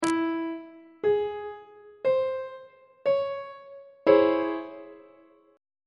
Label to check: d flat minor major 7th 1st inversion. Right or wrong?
Right